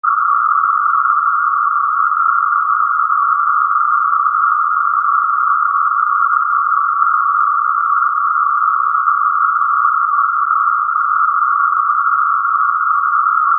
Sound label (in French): bruit rose